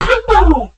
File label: combo; balloon rain